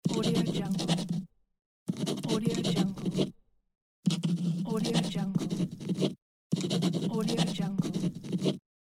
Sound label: pen write on paper